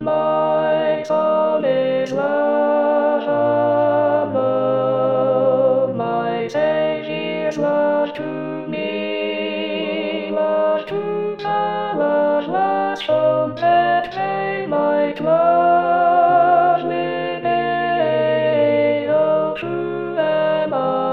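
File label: My song Alto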